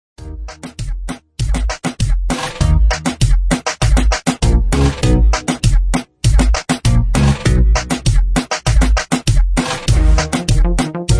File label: cubaton remix